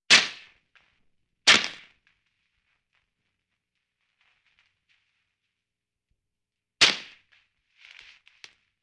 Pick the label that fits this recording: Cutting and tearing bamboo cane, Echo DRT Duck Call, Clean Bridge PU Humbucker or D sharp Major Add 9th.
Cutting and tearing bamboo cane